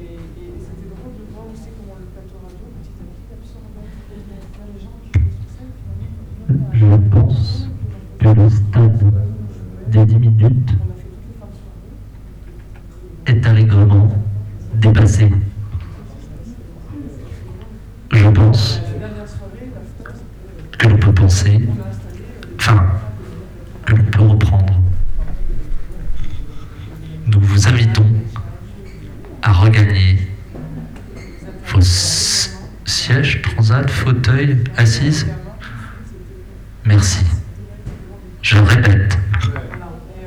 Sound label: Concert